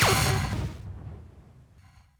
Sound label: Bolter Attack